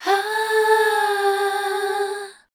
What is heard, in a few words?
A, Voice Spectral; MELODIC; Best Service; Voice Spectral II